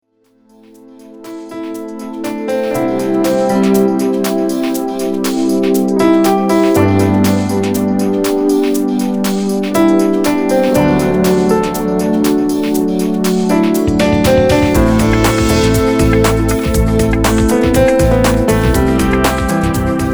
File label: backbeat; budapest